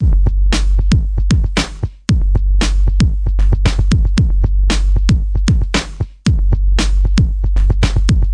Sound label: boomtastic cruncher, 115 bpm, drum loops and breaks